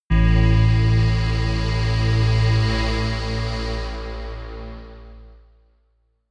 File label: synth sway deep